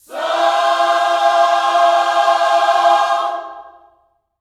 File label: SOUL, Vocal Planet Gospel